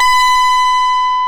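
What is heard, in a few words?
distorted guitar B4, AKAI S-Series; AKAI S-Series CD-ROM Sound Library VOL-8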